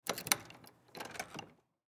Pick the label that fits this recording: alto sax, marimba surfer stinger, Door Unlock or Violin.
Door Unlock